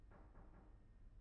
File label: knock; IEEE AASP